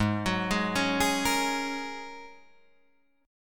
Ab 7th Suspended 2nd Suspended 4th, Guitar, Drop D